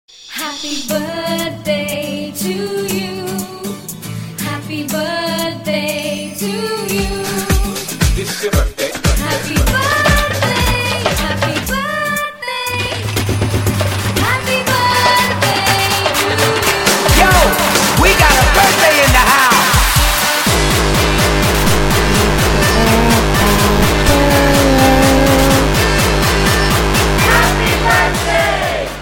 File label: happy birthday mix